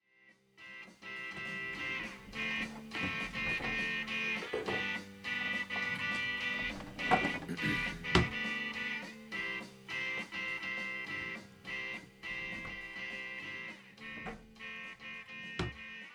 Background noise

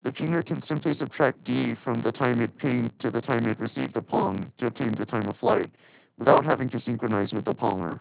lpc pitched

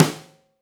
snare

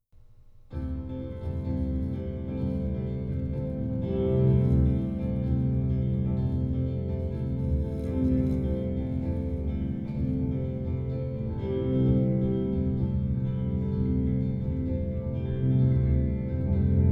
undamped

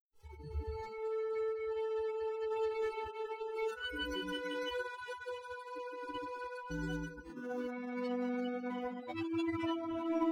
extracted viola

